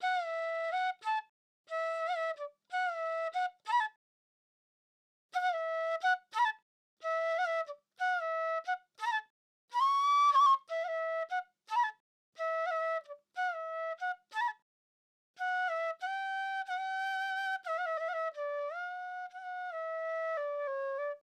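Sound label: Flute D major 90 BPM, Dancehall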